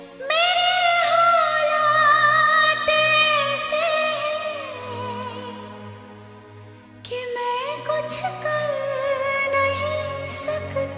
Ringtone Female

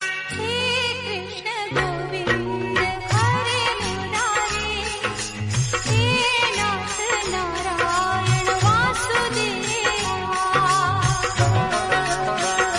Bhakti Ringtone

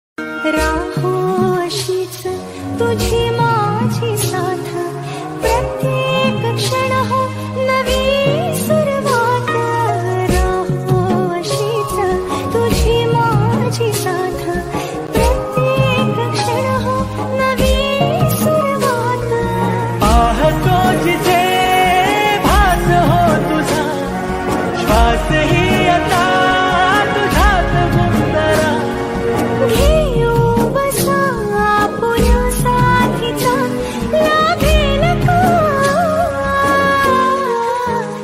Marathi Ringtone

